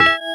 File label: Countdown